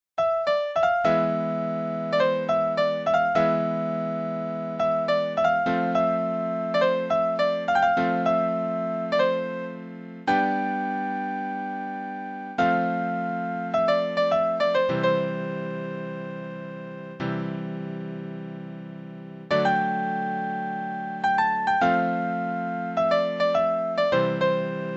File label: Piano